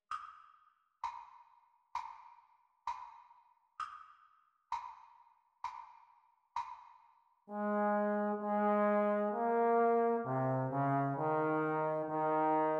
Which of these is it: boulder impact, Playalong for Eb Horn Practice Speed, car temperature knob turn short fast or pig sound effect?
Playalong for Eb Horn Practice Speed